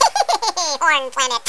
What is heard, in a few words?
hehehe